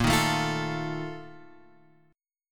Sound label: A sharp Major 7th Suspended 4th, Guitar, Open G